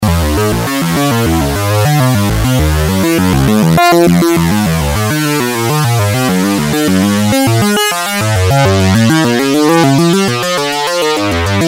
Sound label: tr saw bp